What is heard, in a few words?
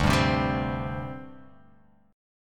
D 7th Suspended 4th, down, Guitar, Open F; strummed; JGuitar